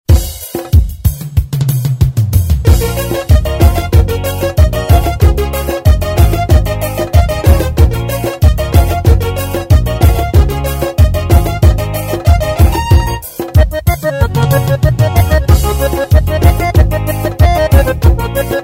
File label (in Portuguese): xote swingado